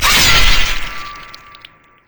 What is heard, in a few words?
crit attack; LoL; yasuo base